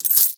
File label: gold up; skyrim